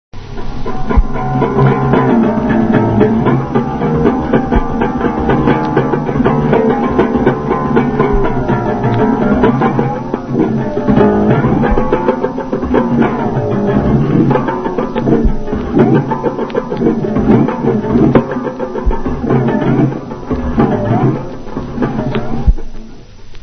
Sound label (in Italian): ronzatore, futurismo musica; russolo